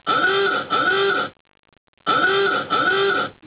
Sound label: Uaua